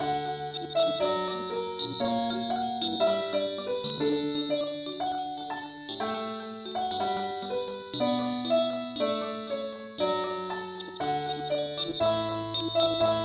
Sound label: mandolin key d